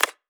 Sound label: Percussion, Hip Hop, Drum One Shots